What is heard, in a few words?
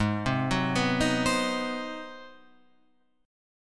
G sharp 7th Sharp 9th G sharp, down, Guitar, Open F; arpeggiated; G#7#9